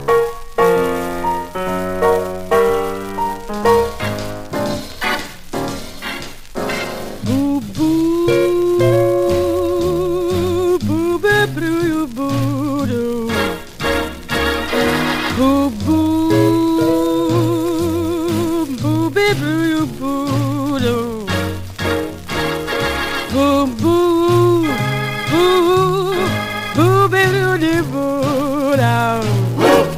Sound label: ringtone